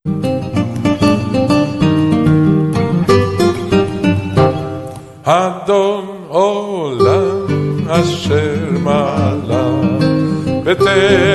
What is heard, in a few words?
Latin Guitar; Adon Olam